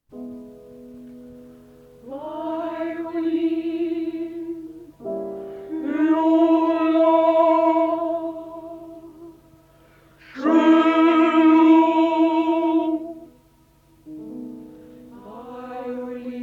Demonstration of Different Colorations on Same Notes